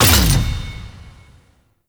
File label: challenge complete; Kahl; Veilbreaker; Warframe